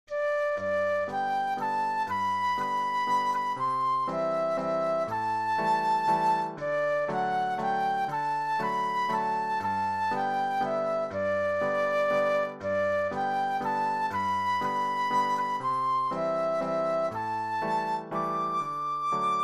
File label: Flute Solo